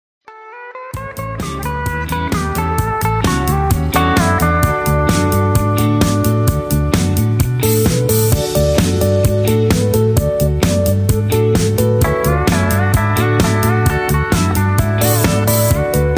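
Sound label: Instrumental Leads No background vocals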